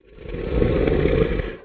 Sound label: monster